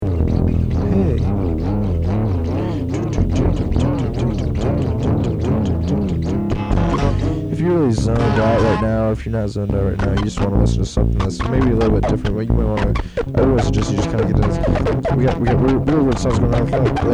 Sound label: Laser Beam